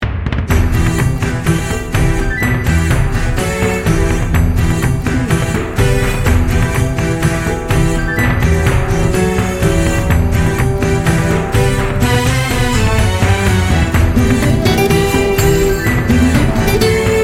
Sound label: heroic drama action